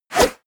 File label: wipe